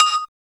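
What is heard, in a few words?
percussion; E-Lab; X Static Goldmine 1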